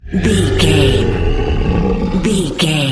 large monster roars

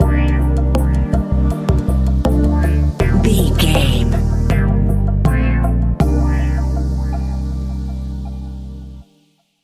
tropical dream stinger

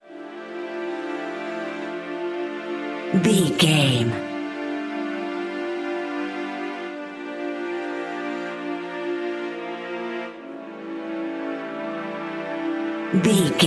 tropical luxury

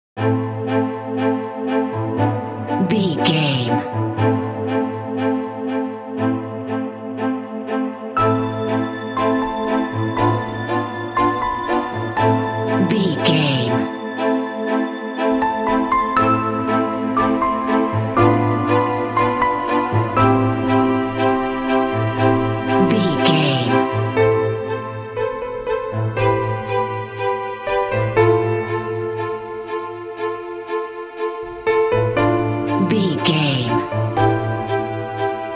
tension suspense worried underscore

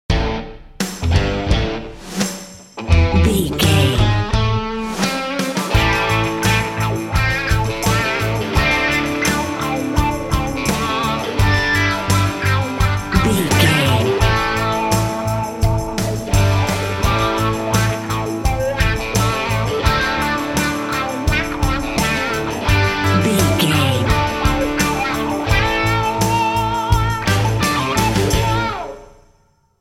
dad rock

